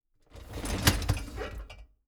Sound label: Household Dishwasher Top Draw Close With Dishes